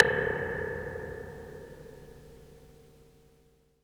RIM, 808; Drum Kit; Polyend; Stazma & Concrete Collage; Spring808

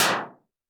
percussion, Roland TD-20

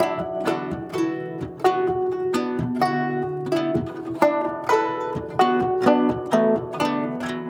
Guzheng Poly-Rhythm; Asia; Continental Drift Aftershocks

